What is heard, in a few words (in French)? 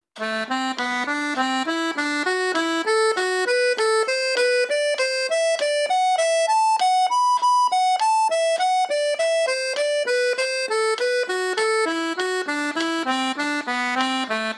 gamme synthe sol tierces vite; tiré; Gamme synthétique de Sol tierces normal en tiré par tiérce